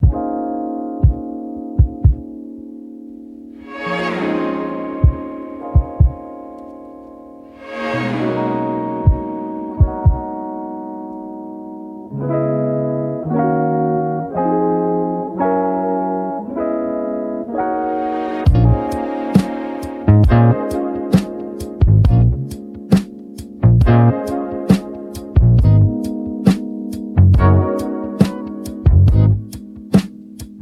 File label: Ebm 137; Beat Block purge vol 03